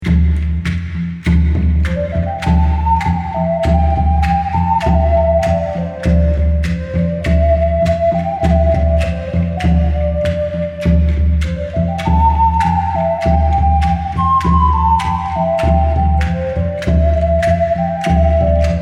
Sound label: indian flute